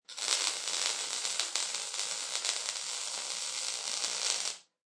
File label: Weld Torch Single, Welding torch blazes.; Welding Torch Blast; Welders; Machines